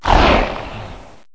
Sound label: attack boss; Repatitis